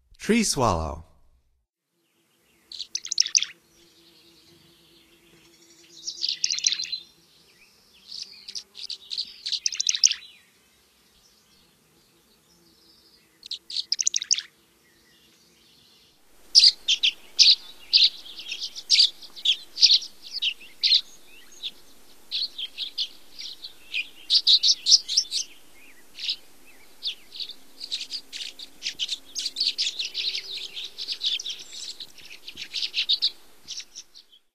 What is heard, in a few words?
Tree Swallow, Songs of Birds of North America; Stokes